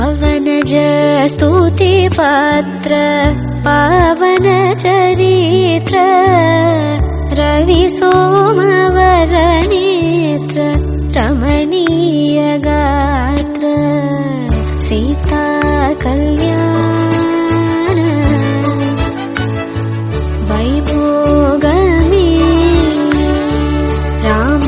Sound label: serial song, tamil; kalyana veedu